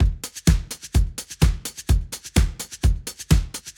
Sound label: Cozy 127, drums loops; RoBKTA Sample Pack Supreme